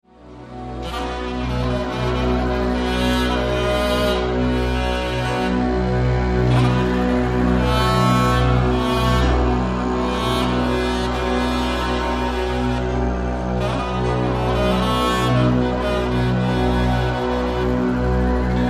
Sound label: wooden sax tenor